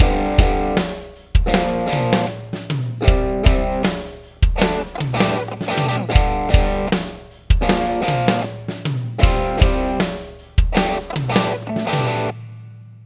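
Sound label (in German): Swamp gedoppeltes Gefiedel Drums 78 bpm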